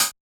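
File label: Hi-Hat FX Open; Yamaha DJX-2B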